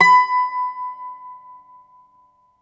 IRISH, Old World Instruments; E-MU Producer Series Vol. 7 – Old World Instruments; World Combos; Ireland

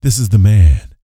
Vocal Planet R&B Dance BASS CATCH

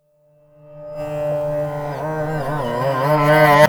Sitar Hit; Sonic Foundry (Sony Creative Software); Electronic Point Blank; Drums & Percussion

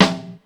Live Snare

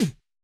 perc, Roland MC-909

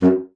F#2, bassoon; Xsample VOL-6; C BASSOON

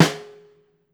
SNARE, Steel Percussion; E-MU Producer Series Vol. 7 – Old World Instruments; World Combos

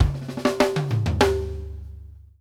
short toms, drum fills

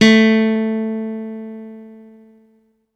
guitar steel; MIRAGE

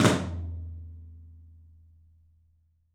13 TOM, 3D Drums; 3D Sound

